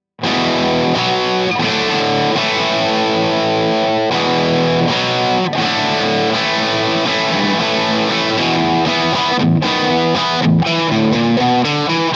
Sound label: rock chords; jensen tone